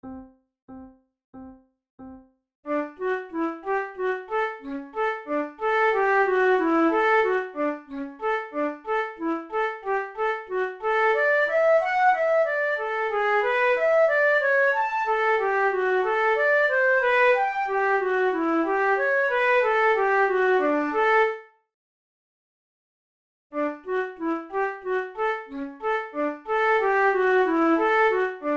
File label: flute; school of flute grade1